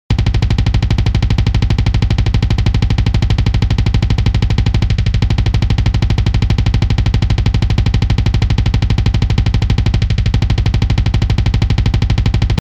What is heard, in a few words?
750bpm